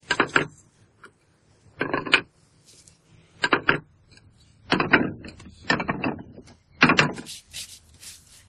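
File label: placing Campfire; Project Zomboid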